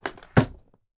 foley flightcase down on table

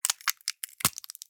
Gore Break Snap; Just Gore 2; soundbits

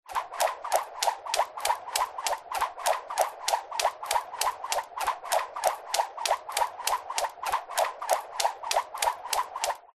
sport gym skipping rope

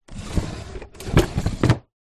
household antique larder drawer open close

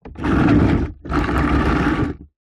foley plastic pot tub move scrape concrete ground

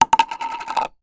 plastic hollow disc small down on table; foley; zapsplat

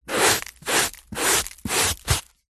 sweeping up dirt debris carpet dustpan brush; foley; zapsplat

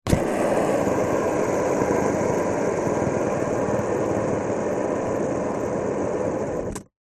leisure fidget spinner on table spin

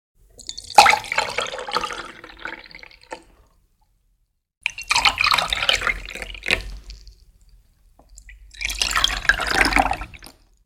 water pour glass; sound effects one

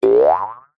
cartoon boing ascend jaw harp; zapsplat

